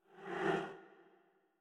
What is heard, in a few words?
Orb Deactivate